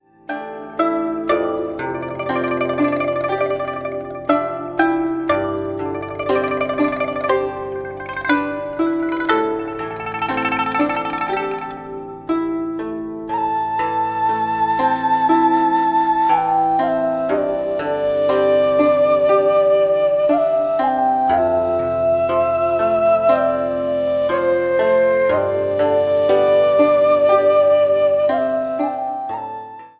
Soothing Melody: Folk Ensemble; MIUI12

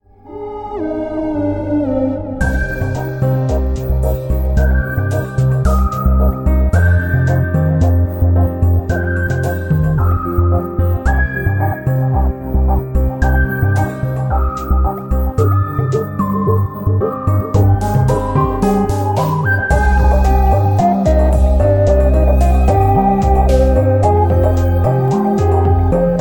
Electronic Mystery, ringtone; MIUI12

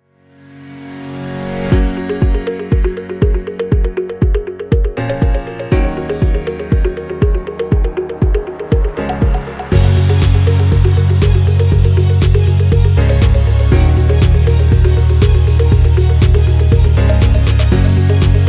Successful Inspiring; MIUI14

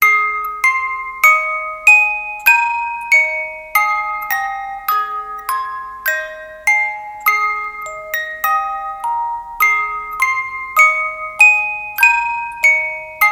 Music Box; MIUI12

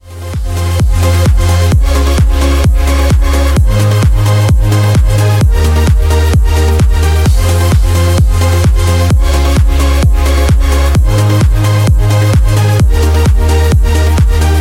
Dynamic and dynamic urban electronics; MIUI14

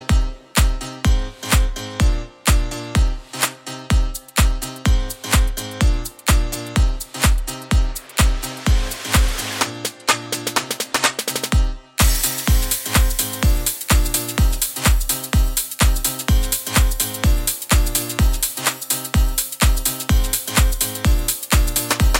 Electronic pulse; MIUI12

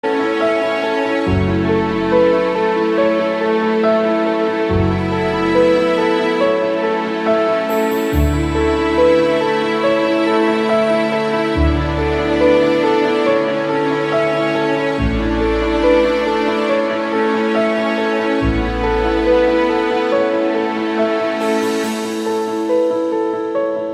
Gentle and Touching, ringtone; MIUI12

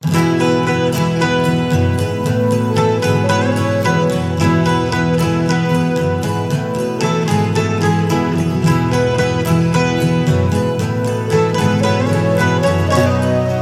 Shadow Play Acoustic; MIUI12

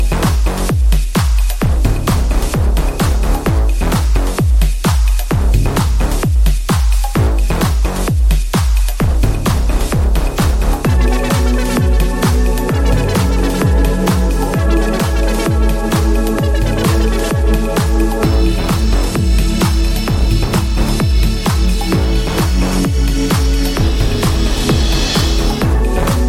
House Rhythm; MIUI12

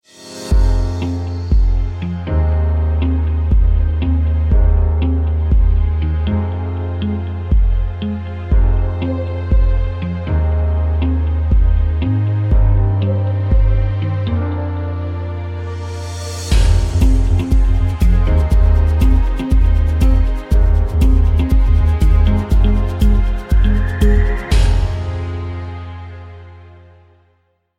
Tech Ambient; MIUI12

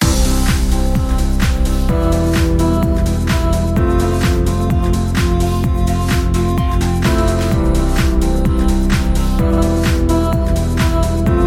Future Pop House Loop; MIUI12

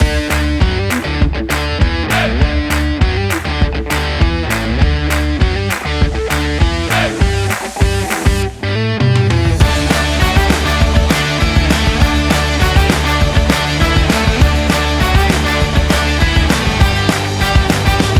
Happy Ska Rock; MIUI14 and HyperOS 1.0

